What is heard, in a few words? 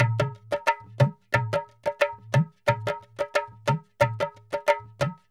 Gabra Dholki Loops